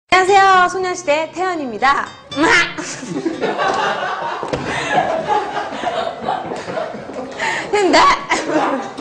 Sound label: Cute Noise